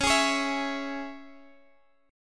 C sharp Minor; C#m strummed

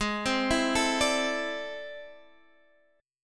A Minor Major 11th

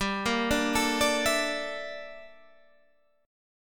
G Minor 6th Add 9th G down Guitar Standard; Gm6add9; arpeggiated; JGuitar

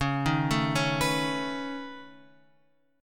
C sharp 7th Suspended 2nd Sharp 5th C sharp; C#7sus2#5 Chord {9 6 7 6 X 7} arpeggiated

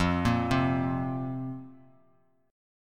F Suspended 4th F down Bass Standard; arpeggiated; JGuitar

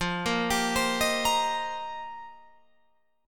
F 7th Suspended 2nd Suspended 4th F Guitar Open F; F7sus2sus4; 17,17,19,19,18,17; arpeggiated

